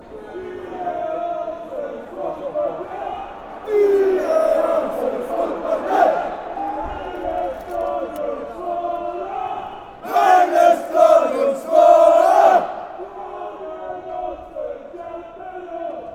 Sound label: aalesunds chant